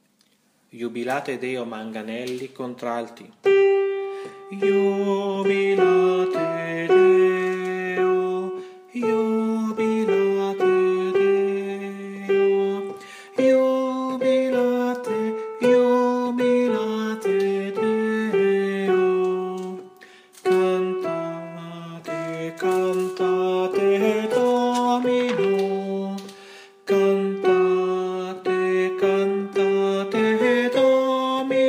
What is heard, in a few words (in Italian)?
Contralti; Iubilate